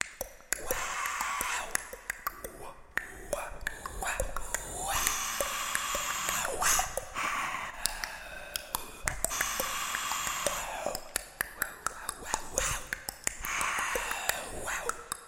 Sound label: Clicks And Whispers; vocal sounds